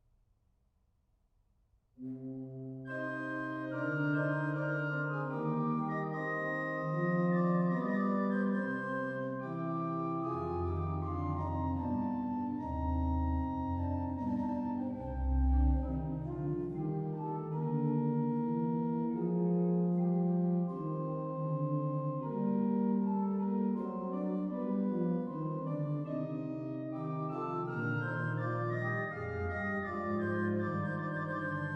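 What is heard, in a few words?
Symphonie, organ; Clicquot; Couperin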